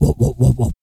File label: REGGAE, Vocal Planet World Voices